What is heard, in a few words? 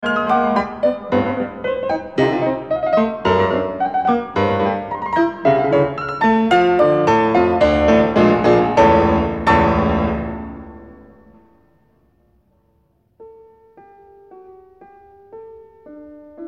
fugue choral theme; hammerklavier